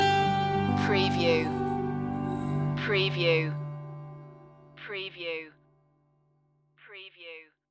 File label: rageshri prelude stinger